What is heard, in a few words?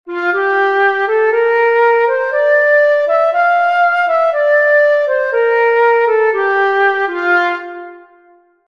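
gamma fa major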